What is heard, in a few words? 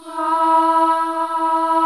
Boy Choir E4